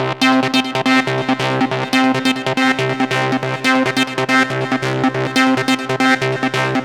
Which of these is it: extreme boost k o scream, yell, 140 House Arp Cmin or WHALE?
140 House Arp Cmin